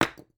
masonry hit; teardown; ps5 windows xbox series xs

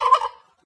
Turkey Man Idle

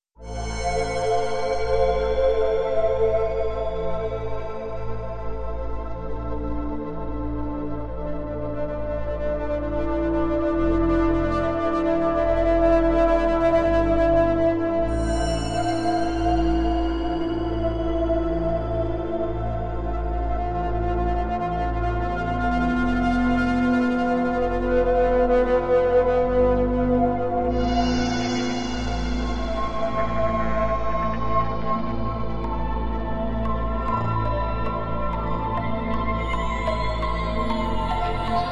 Ambient; Hard Reset

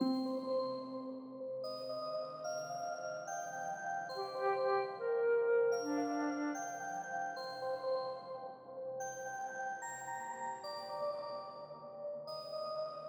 Garden MELODY; Garden v3

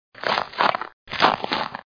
Deer Snow Loop

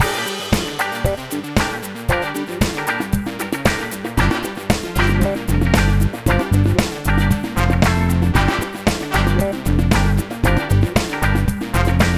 Mus Disco Zombie Loop

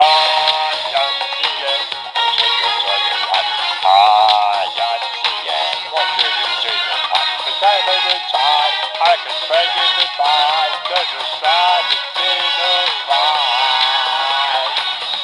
Fish singing; raving rabbids travel in time wii gamerip 2010

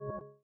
score bonus shown; teardown; ps5 windows xbox series xs

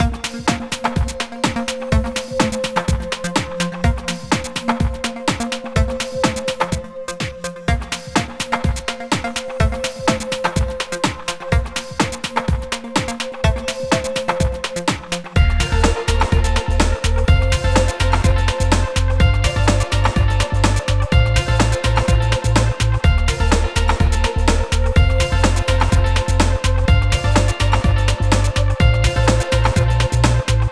Music Observation Results; Smart As...; PS Vita

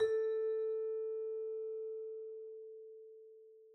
Scale; Second Scale1; tintin reporter cigars of the pharaoh

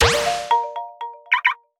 vehicle build finish; Holy Potatoes! A Spy Story

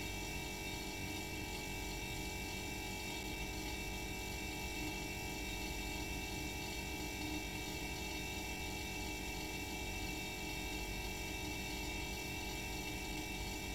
light sodamachine; teardown ps5 windows xbox series xs gamerip 2022

